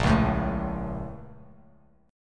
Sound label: A sharp Minor Major 7th chord, down, Bass; A#mM7 strummed; Bass Standard; JGuitar